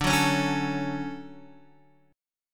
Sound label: D Major 7th, D, down, Guitar DADGAD